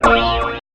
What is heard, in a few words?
FX shot, Drum+Bass, 160; eJay; Singleshots